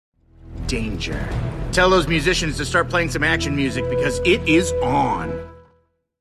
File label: action music